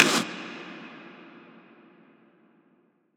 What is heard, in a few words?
rim hall